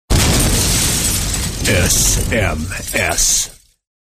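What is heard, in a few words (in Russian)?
взрывное смс; vzryvnoe sms klassnoe sms na telefon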